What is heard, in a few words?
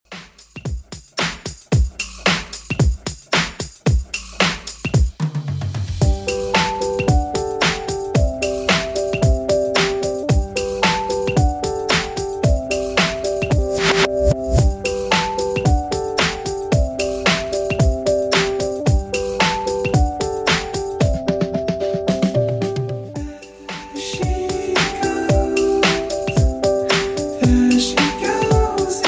90s Redrum, 112bpm